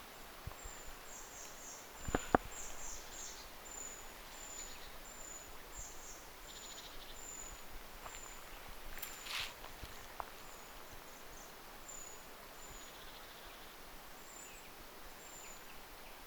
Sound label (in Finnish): melko erikoista puukiipijälinnun ääntelyä